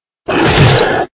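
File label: werewolf skill A1; langren skillA2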